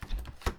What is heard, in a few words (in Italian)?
Porta unlock; Phasmophobia